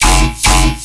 Bass Hit F, Dubstep; Necrotic Surge; Ghosthack; Demolition

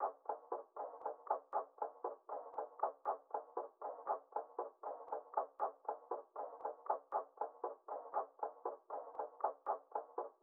Drum Loop 118 BPM Percussion; Loop Stems; Cymatics; Vibes II Drum Loop Collection; How So Drum Loop

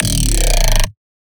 Bass Shot X Gnarly, Dubstep; Ghosthack; Advent Calendar 2018

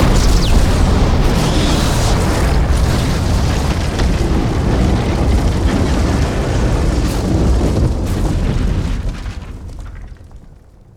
explo bomb; Explosions; PremiumBeat

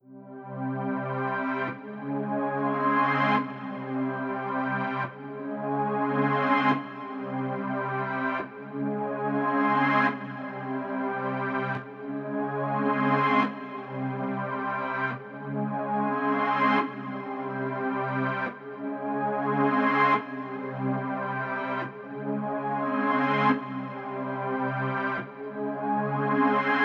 chords, 143 BPM C Min; Stems; Cymatics; Cymatics - CHAOS Beta Pack (MIDI + Stems); Sucker Punch

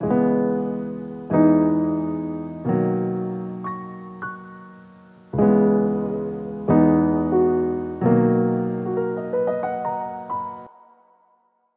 Piano Wet, 90 BPM B Maj; Melodics; Cymatics - Eternity Sample Pack

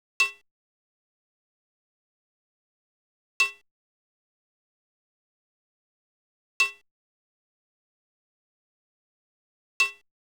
Nostalgia Drum Loop 150 BPM Percussion; Cymatics - ANALOG EVOLUTION Beta

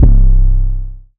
808 - C; Cymatics; Trap Starter Pack; Crucial

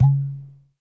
Liquid Ibo - C#; percussion; Cymatics - Infinity Beta Pack 2.0; Zeus Percussion Collection

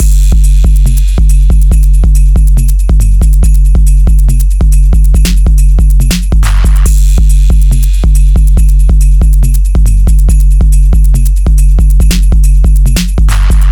Footwork Drum Loop - 140 BPM; Experimental Drum Loops

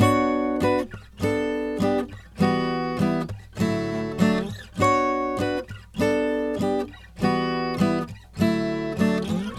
Guitar Loop - 100 BPM B Min; Instruments; Guitars; Cymatics; Light Free Sample Pack; Bonus Samples

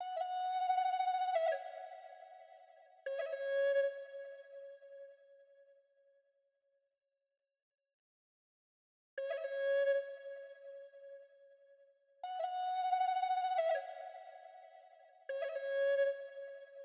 157 BPM F# Min Flute; Melodies; Loop Stems; Cymatics; Pharaoh Premium Drum Samples (BETA); Valley of the Kings

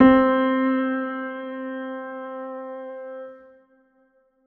Piano C, Trap One Shot; HipHop; Melody Kits; 150 D#m; Ghosthack; Ghosthack - AC2024 - Day 5 - Melody Kits; Creeps

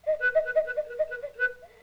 LoFi Cuckoo Series; Vintage Cartoon; Foley

